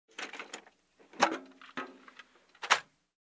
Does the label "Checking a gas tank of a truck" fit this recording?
Yes